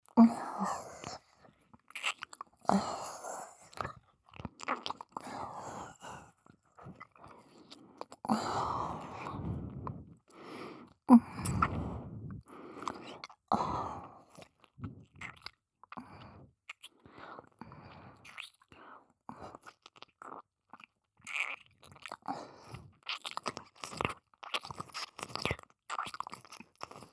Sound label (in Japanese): 耳舐め・ふつう